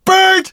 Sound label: bird; portal 2